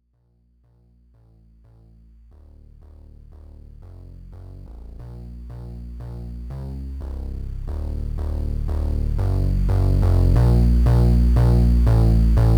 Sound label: bass